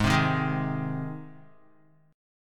Ab Major Ab down Guitar; strummed; JGuitar

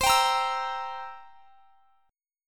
C 7th C down, C7; strummed

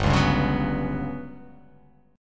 G Major 7th Flat 5th

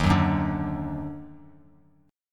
D sharp Minor Major 7th, Bass Standard; strummed

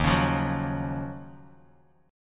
Eb Minor 9th Eb Bass Standard; Ebm9; strummed; JGuitar

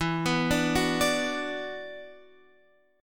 F sharp 7th Suspended 4th Sharp 5th E

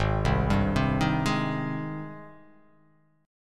G sharp Major 7th G sharp

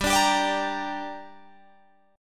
G Minor G down Guitar Open G, chord

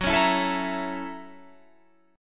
F sharp 7th Sharp 9th

